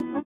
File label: sitar, Indiatek; Spectrasonics; Metamorphosis